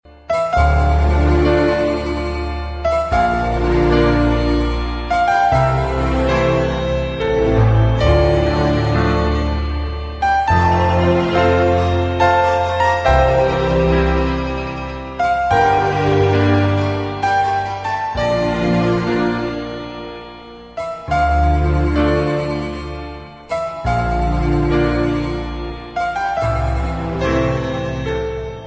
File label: Unstressed Melody